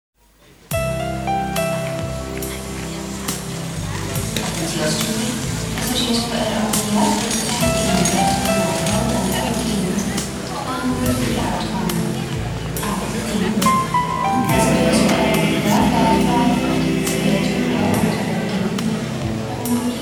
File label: Gating